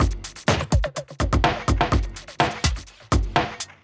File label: Drum loops, Electro